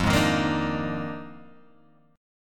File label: F Minor 13th, Guitar Open G